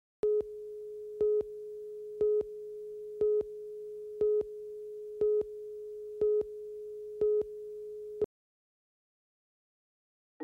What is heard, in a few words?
Edited Music; University Place